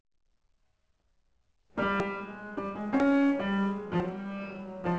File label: saxophone